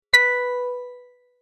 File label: multimedia tone verified; Tones; Sound Design; McKinney Sound